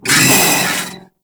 Vocal Pain; Warframe; The New War; Archon; Nira

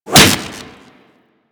Whip Impact Default; Warframe; Weapons; Corpus; Impacts

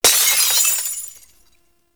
Glass Shattering Enemy Shatter; Warframe

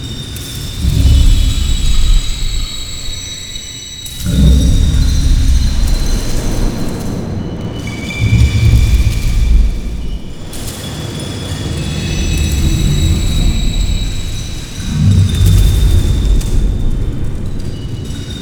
Infested Eris Interior Loop; Orokin

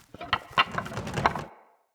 Cage Rattle; Avakot Ode; Level Events; Midrath

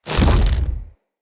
Corruption Grip Lock; Characters; Enemy; Lady Deora; Abilities

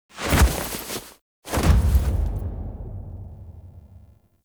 Death Fall; Warframe; The New War; Drifter; Foley

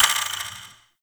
Puzzle Button Timer; Warframe; Duviri; Medusa Puzzle

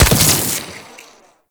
Adhesive Fire; Warframe; Corpus; Arachnoid Hunger; Abilities

